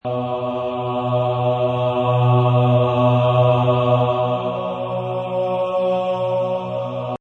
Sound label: Horr Male Choir Bm, Male choir horror loop in B minor at 135 bpm.; Choir Loops